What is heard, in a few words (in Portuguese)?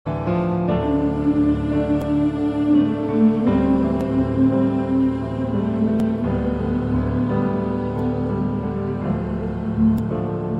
toque de anjo; toques para celular